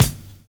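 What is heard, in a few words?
PHASE cymbal; Univers Sons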